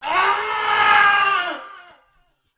scream

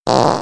fart; hl2dm

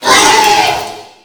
wretch tunnels attack; A Machine for Pigs

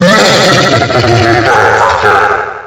attack; freak fortress 2; dry bowser

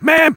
jump; freak fortress 2; meemswarm; spy

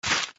hit; weepingangel

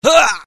hurt

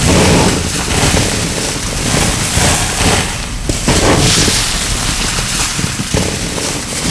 acid idle, acid spill trap; traps

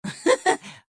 laugh short; Adachi

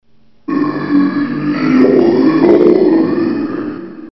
zombie; dod

